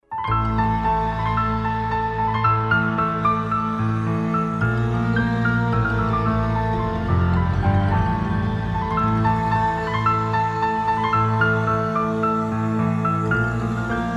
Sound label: instrumental